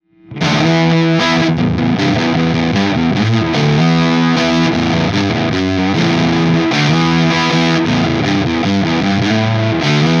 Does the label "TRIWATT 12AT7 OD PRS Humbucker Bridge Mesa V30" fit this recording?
Yes